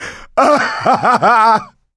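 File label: laughter, male; survivor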